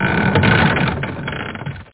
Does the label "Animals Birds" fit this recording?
No